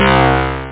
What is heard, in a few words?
elecguit; da capo vol1; guitar